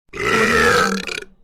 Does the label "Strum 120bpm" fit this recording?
No